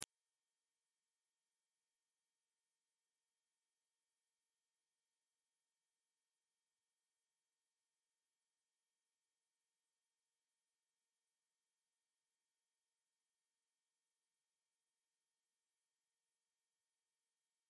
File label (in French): Sonnerie anti-jeune Moustique